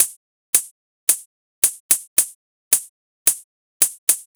NRG Cymbal

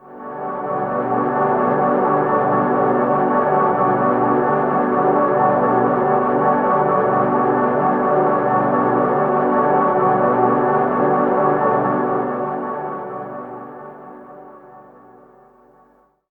Major 7 Mist G, Ambient Pads; Zero-G Seismic Frequencies; 90 s Sample CDs

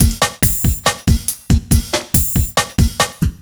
Cheep Beat, Drum N Bass; Sonic Foundry (Sony Creative Software); Knutrix Drum & Bass

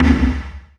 Synth Perc; Dream Zone

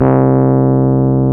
PNO, Rhodes Mk.V; Keyboards of The 60's and 70's

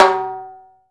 rimshot Timbales; E-MU Producer Series Vol. 2 – More Studio Essentials; Composer; Timbales Xswitch

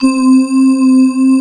TS 10 GLAS, SYNBELL

